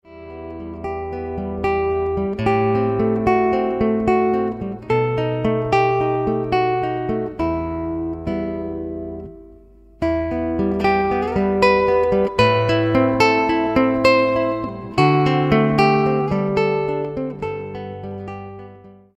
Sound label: etude in e minor, guitar